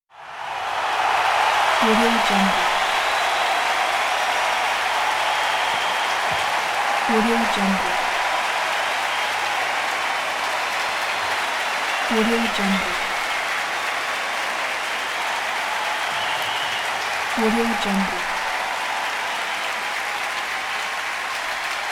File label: crowd cheer